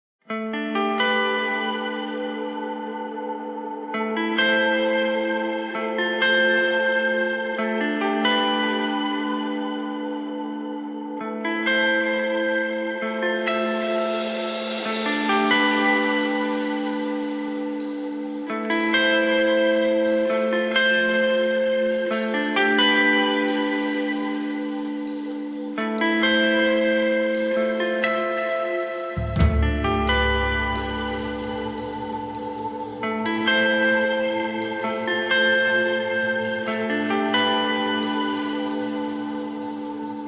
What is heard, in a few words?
instrumental; Pony Music Archive